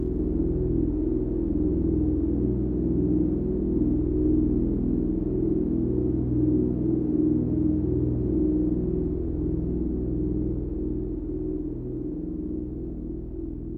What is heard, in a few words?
E drone